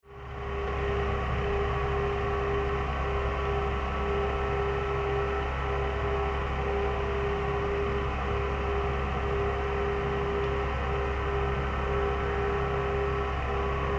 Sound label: Ambience Factory Hum Industry; Factories